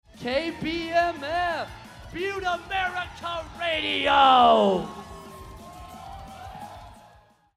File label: Depot Crowd; STATION IDs